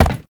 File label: Step Glass; Warframe; Uriel